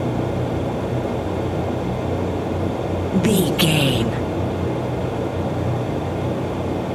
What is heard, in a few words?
background fan air vent loop